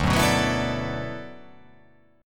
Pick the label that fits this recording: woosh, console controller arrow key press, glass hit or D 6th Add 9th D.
D 6th Add 9th D